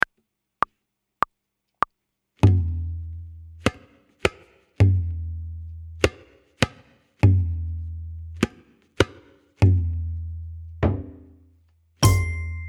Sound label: Group Piece with tabla